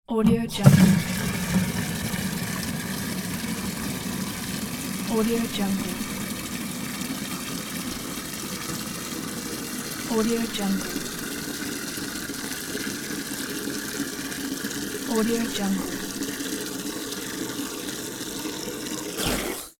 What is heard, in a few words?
filling plastic watering can; domestic sounds